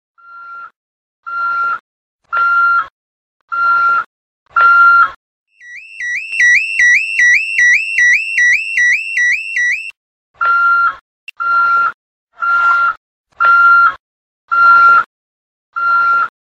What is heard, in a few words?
crazy alarm clock; meloboom